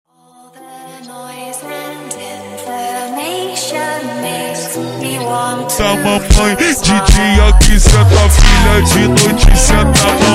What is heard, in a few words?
Brega Super Slowed, funk